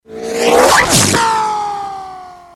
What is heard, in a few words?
Reverse Ricochet